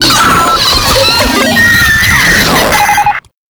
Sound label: Idle, Vocals; Warframe; Proto Infested; Brachiolyst